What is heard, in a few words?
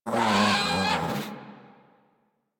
Catbrow Combat Vocal Death; Warframe